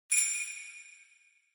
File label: Sleigh Bells Reverb Shake Hard